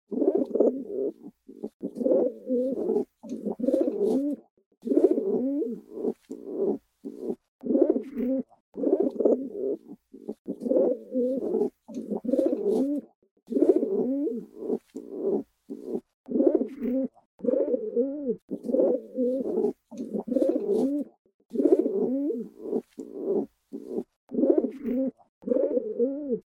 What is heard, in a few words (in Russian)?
vorkovanie golubej